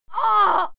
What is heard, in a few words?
female fight scream pain; schreie; menschen